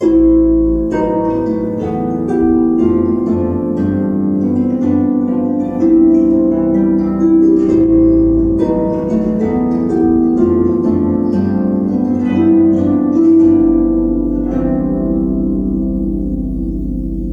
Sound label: Wedding March